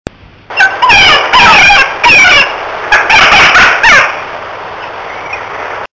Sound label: magellanic woodpecker